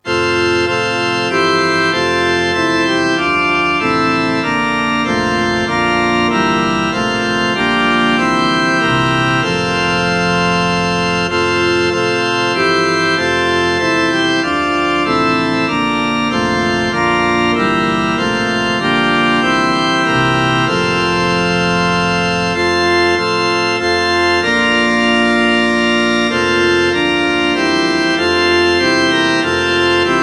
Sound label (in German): choral orgel; lobe den herren